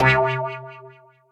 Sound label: Roller, P-50 Linear; Ableton; Retro Synths; P-50 Structures